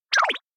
elec wriggle; awayuki; anemoi

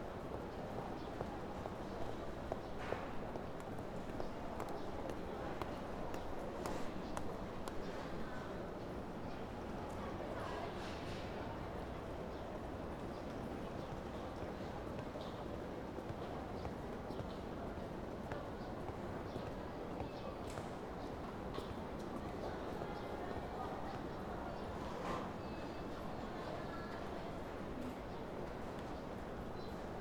indoor cityhall chatter; LOOP; anemoi

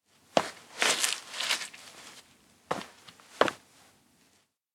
step pose; anemoi

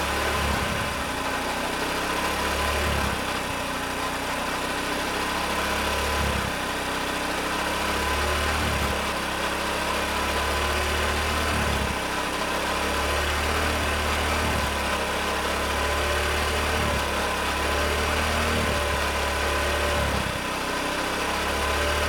cub run fast loop; anemoi

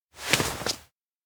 turn; koyomi; anemoi

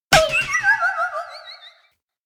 heartbreak; chiharu; anemoi

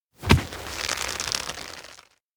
fist press stomach; anemoi

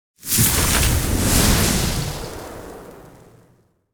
creature shout; anemoi

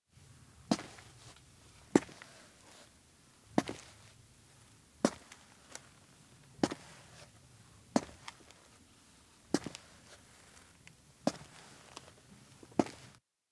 steps outdoor dazed walk; anemoi

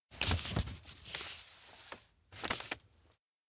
letter unfold; ukishima; anemoi パッケージ版

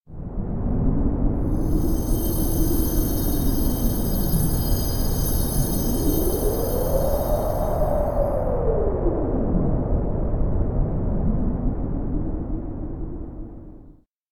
elec fairyring heavy breath wind; anemoi

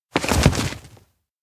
sit porch; anemoi

